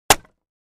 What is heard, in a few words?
Axe Wood Crate Palett